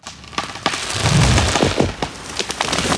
treefall creak; World of Tanks Blitz

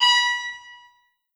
beep elevator floor passing

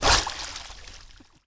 under water end

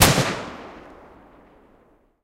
mg 16mm kpvt lastshot; guns; tanks; War Thunder

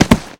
dryfire bodyfall dirt

